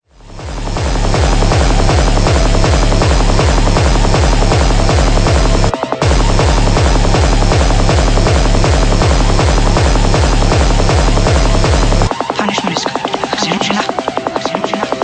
rusty, decompression glitches for sound generation